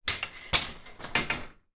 household ironing board fold put down

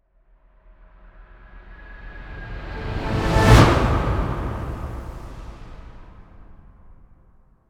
Swell To Impact Orchestral no shovel; bottlerocket

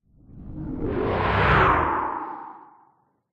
science fiction spacecraft small zoom by pass

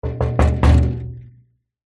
impacts metal trailer side hit knock

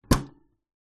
animals mouse cage plastic lid hatch close; zapsplat

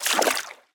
fantasy Footstep Water; little robot sound factory

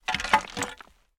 metal pole rusty drag on loose hard surface

